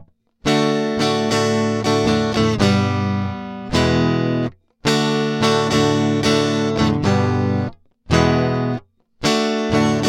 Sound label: Magic Pre Strat; Fredenstein